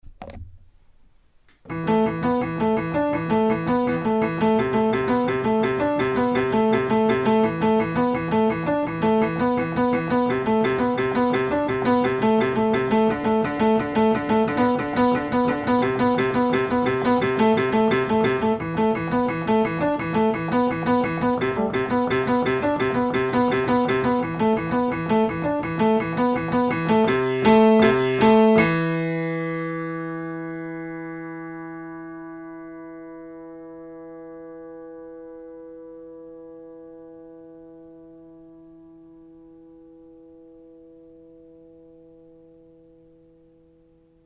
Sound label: Just Driving Minimal